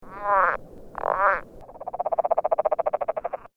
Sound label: rana boylii, frogs